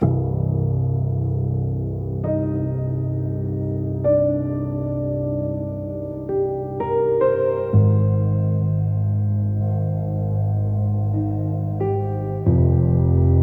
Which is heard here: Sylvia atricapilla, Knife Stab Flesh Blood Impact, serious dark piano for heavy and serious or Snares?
serious dark piano for heavy and serious